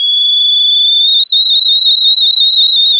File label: pop, sound of a tea kettle whistling